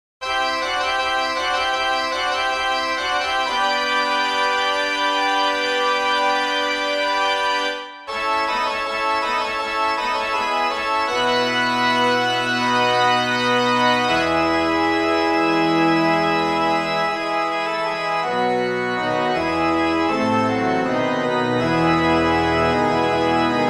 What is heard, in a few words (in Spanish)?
fanfarria calle rap